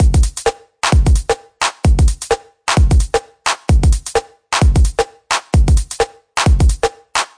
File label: 130 frosty break, DRUM LOOPS and BREAKS